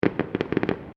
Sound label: Fireworks Multiple Crackles; Backgrounds; Fireworks (Sound Effects:Backgrounds); CraftedSignals